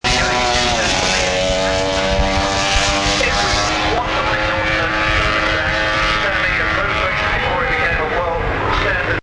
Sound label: Guzzi V8 run; goodwood 2002